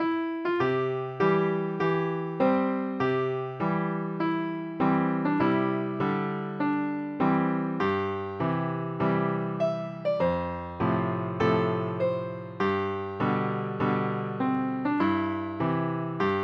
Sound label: school music